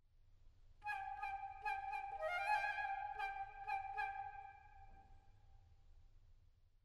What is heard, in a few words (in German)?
zaunkoenigmotiv querfloete